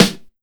SD, Ragga & Dub Reggae; USB Soundscan vol.27; AKAI

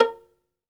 SOLO VI, Synclavier Strings; ILIO

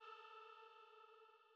Female Pad A3

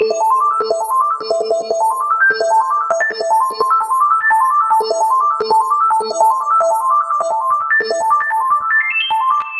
Seq ZigZag; Zero-G; Future Wave

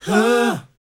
BOYZ G#, Vocal Planet R&B & Dance; ILIO

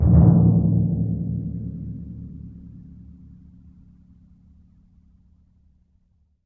TMP, Synclavier Percussion

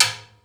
Timbale Rim, Ensoniq ASR-X Pro

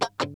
CHUCK KUH, FUNK CHOPS, guitar; Spectrasonics; Hans Zimmer Guitars VOL-1

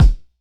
Kick Steel Backbone; Airwindows

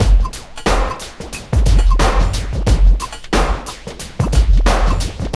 TRIP 90, trip loop

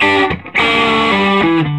SOLO G, Vintage Blues Guitar; USB Soundscan vol.22

